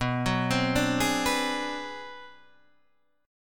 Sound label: B Minor 6th Flat 9th B